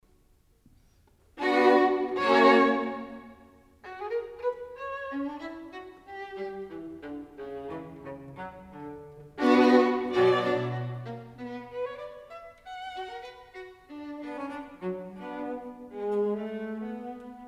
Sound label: String Quartet 14 In C Sharp Minor, Allegro Moderato; Beethoven; Op. 131